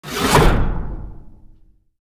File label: Drawer Close Metal